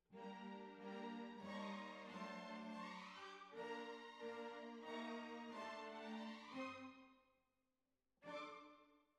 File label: Cbasses